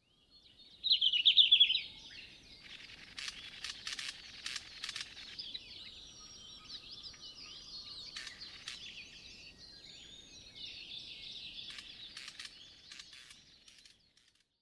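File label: Red-flanked Bluetail; ruribitaki; shiga; cyberforest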